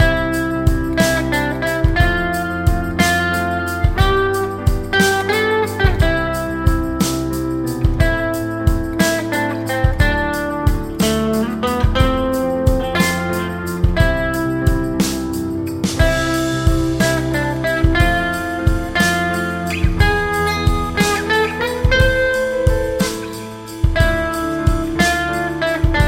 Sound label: Triad; novembeat 2018